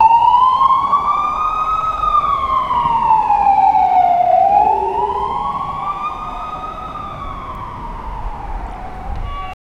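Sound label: tokyo cityscape sounds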